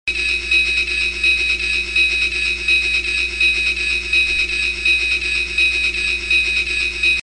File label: bell; trainsound